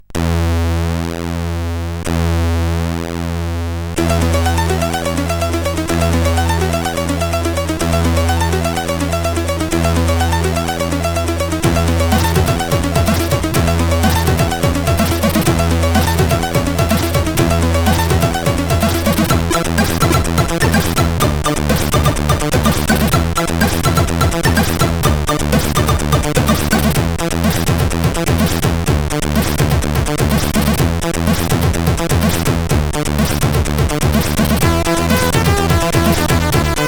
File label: Lame Old Tune sid CSG8580R5